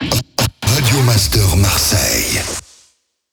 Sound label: Sweeper SLOW